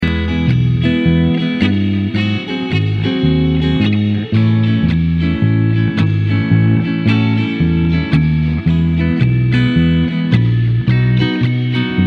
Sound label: walking blues in e